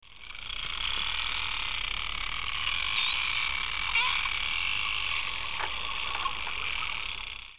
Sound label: echolocation recording